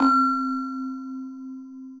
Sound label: Flam Glock, Mallets; Ableton; Glitch and Wash